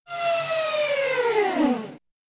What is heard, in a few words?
Crying Motor Down, servos